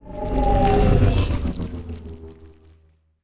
ant ship colony move; biogenesis

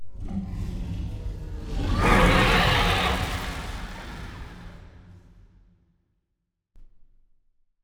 aquatics dragon death

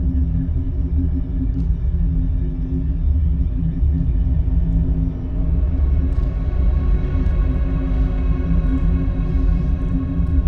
dyson sphere bass hum; Stellaris; utopia

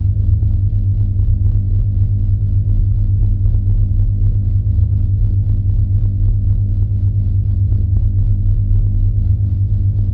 planet killer windup; apocalypse

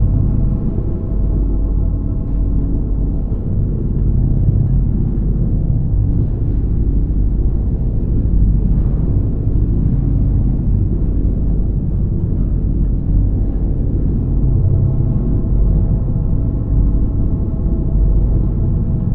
cargo ship idle; caravaneer; Stellaris; megacorp

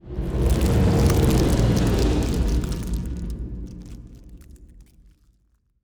ship titan move; Stellaris; biogenesis

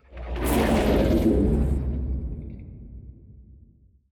void worms troika attack; Stellaris; grand archive; ships

